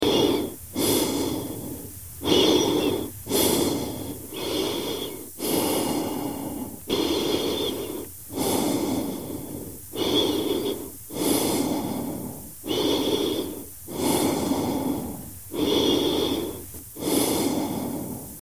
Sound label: atmo scifi space walker